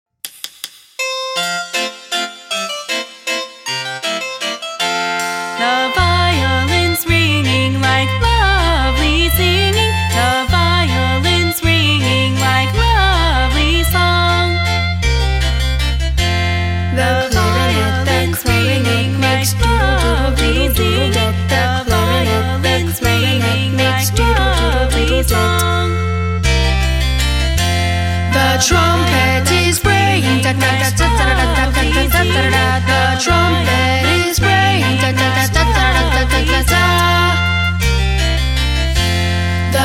Orchestra Song round; A Beka; Songs we enjoy 3

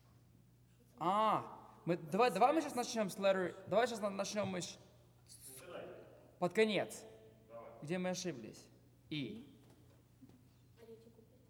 Overhead

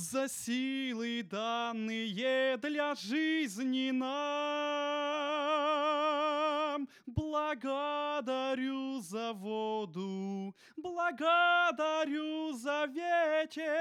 vocal

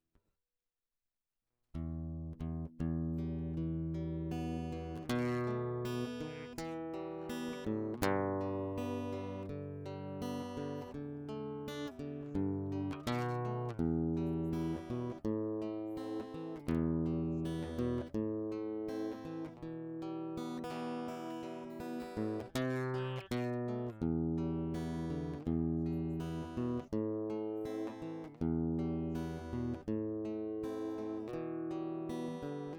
perebor

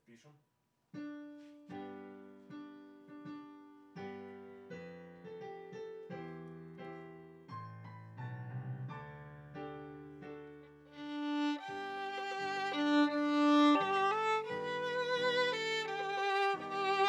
Viola

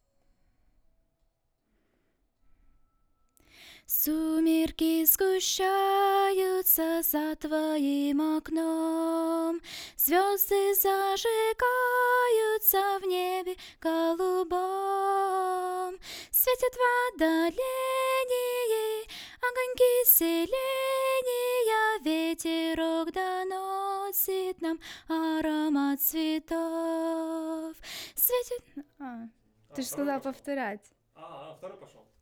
vocal

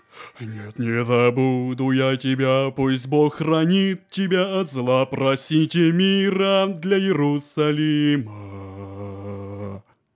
bass

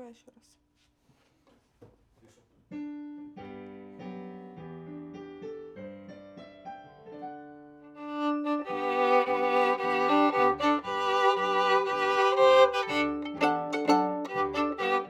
Viola C

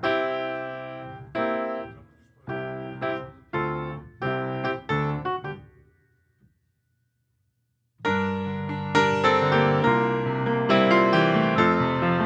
piano

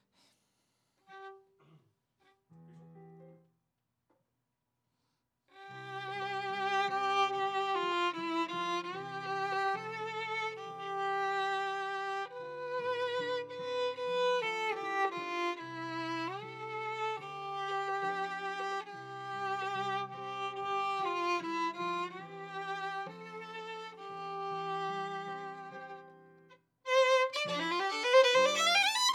Viola D

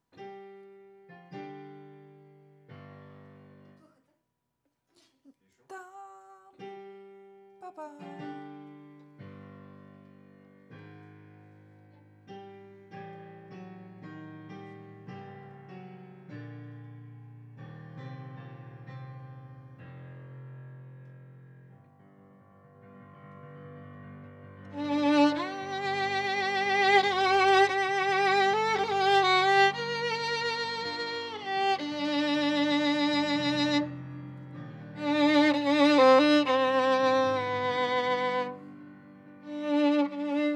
Viola

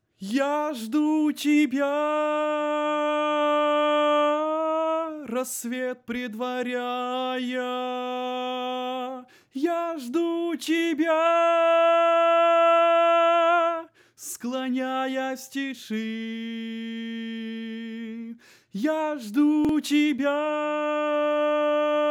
tenor

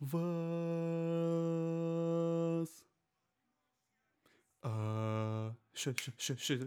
bass

